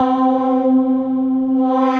Industry Boulevard Keys, Synth Keys

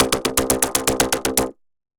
Running Loco, Synth Rhythmic; Ableton; Factory Packs; Punch and Tilt

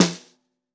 Bottom, Snare

